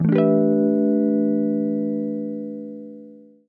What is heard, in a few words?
disintegration; Swapperoo; Android iOS mobile Switch Windows